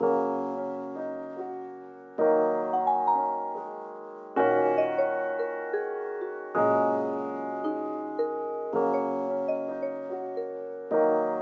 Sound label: Cinematic Happy Wedding Romantic; 婚礼歌曲电影快乐的婚礼浪漫的